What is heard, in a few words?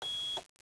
buzzer, beep